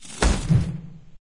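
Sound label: catapult fire; Minecraft; Votive Content; wows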